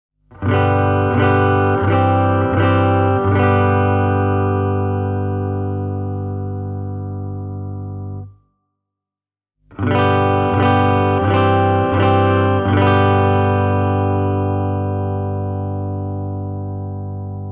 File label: Italia Mondial Classic mag clean; puoliakustinen sähkökitara